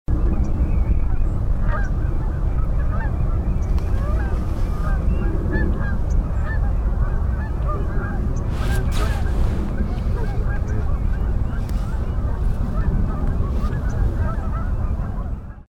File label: Virginias Warbler Chipping; Talbot MD